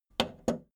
video tape player plastic rewind click slow

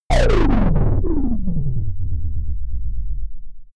power shutdown

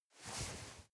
nylon leather very soft

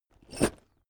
backpack metal single

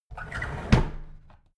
drawer big squeak close heavy

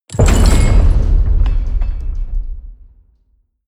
gate slam large

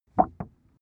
cardboard wobble short hard

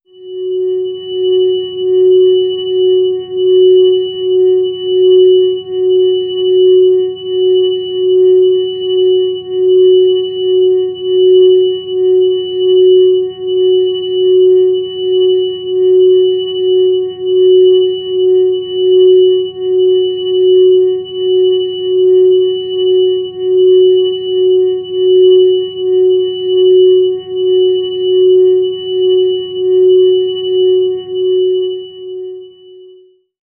organ tone f sharp

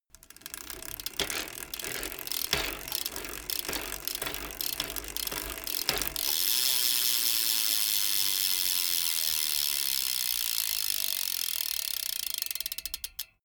bmx bike pedal accelerate coast

